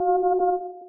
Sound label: radar; no longer alone sfx